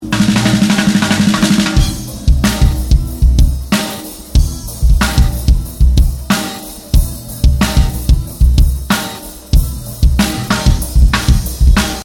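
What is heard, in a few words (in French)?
snare, Caisse-Claire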